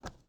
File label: Walk; Rebel